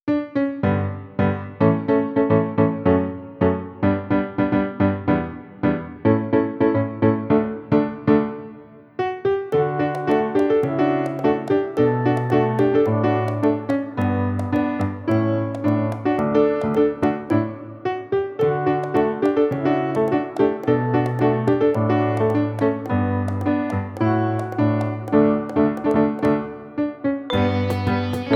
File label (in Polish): wersja instrumentalna; EduMuzArte